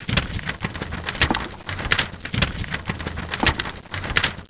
elev move

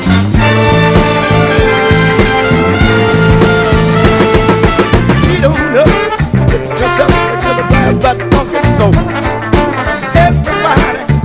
funky

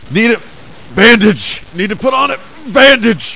Die; USEC

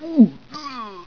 die

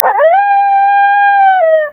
pseudodog howl

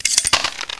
reload, uzi

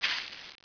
player metal

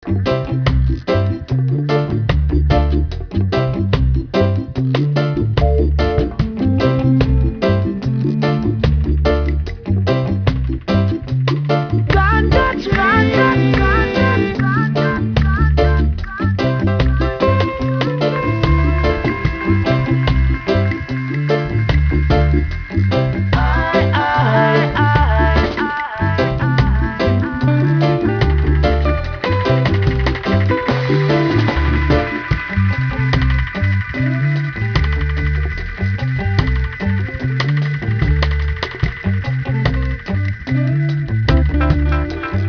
ganja loop; sven

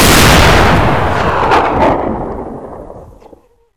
awp; weapons

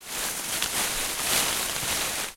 grass

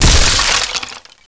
bust crate

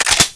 9mmAR reload, weapons; wartso

